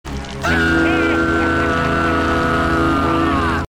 scream; courage

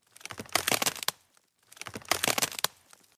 twig snap

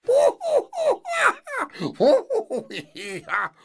hoo hoo hoo ha ha; youve been gnomed

